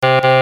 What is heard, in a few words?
error